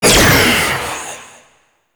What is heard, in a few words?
Scythe Proj Whizz; Stalker Jade Scythe; Warframe; Weapons